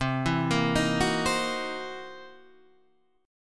C 7th Sharp 9th Flat 5th, C, down, Guitar, Standard; arpeggiated; chord; JGuitar